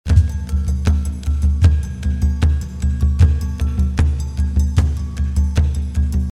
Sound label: drums live, Recorded drum solo; epic orchestral track